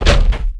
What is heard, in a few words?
dog footstep run; Garry's Mod